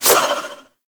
Proj Throw; Hoplite Army Replicant; Warframe; Warframes

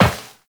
Step Metal Perforated, Footsteps, Walk; Warframe; Characters; Tenno

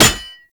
Bayonet Melee Quick Impact; Warframe; Tenno

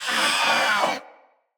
Feral Vocal Death; Catbrow

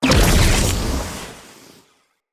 Shrimp Lantern Weapon Projectile Burst; Creatures; Baliq

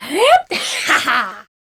Laugh; Warframe; Corpus Lich; Persona D; Lift

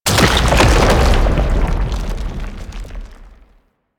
Octopede Phase Transition Stagger; Man In The Wall

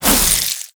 Boomerang Fast Whoosh; Infested

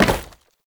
Step Wood, Foley; Warframe; Oraxia

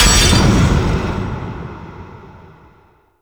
Sword Block Break; Warframe; Duviri; Dax; Weapons

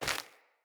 Grunt Step Default, footsteps

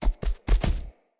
Stag Gallop Staggered Run Vegetation, Footsteps; Animals; Foley

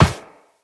Walk Metal Perforated, Footsteps; Operator

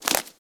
Foley Idle Ear Flap; Enemies; Warframe; Catbrow

